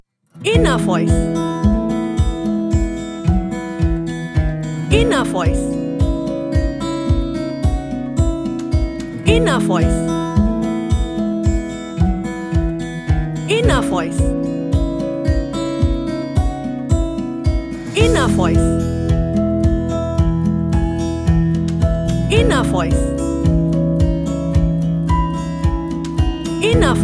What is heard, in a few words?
coffee and milk